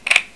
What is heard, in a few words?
leica iiic